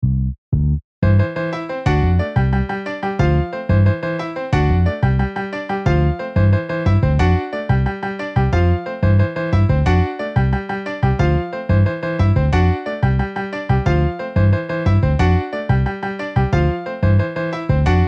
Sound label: Piano Bass MIDI